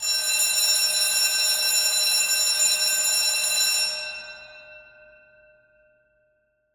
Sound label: Fire Alarm Bell